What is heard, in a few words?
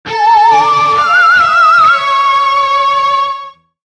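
music stinger lootbox legendary; heroes of the storm full gamerip 2015; hallowsend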